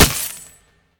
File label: pwr hit; serqet; smite